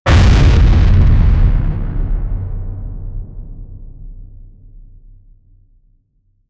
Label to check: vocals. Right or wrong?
Wrong